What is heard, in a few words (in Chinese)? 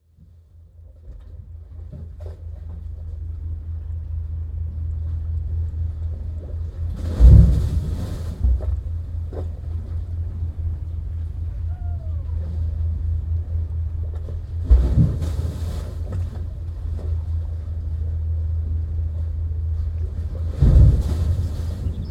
澎湖風櫃